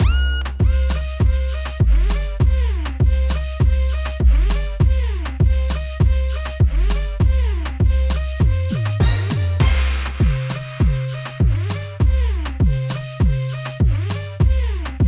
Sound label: instrumental